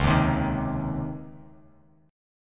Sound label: Db Major 7th Suspended 2nd Db Bass Standard; DbM7sus2 strummed; JGuitar